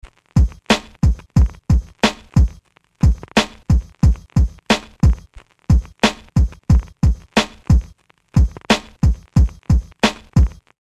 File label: Drums Dirt; A Tale